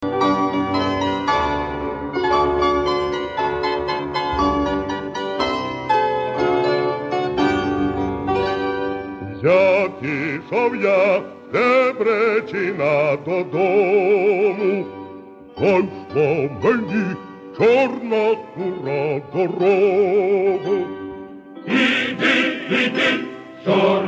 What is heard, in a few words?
ukrainian song